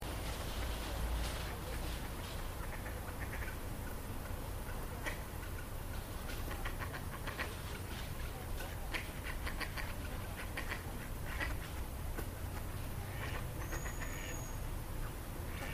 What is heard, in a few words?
Botley Road ducks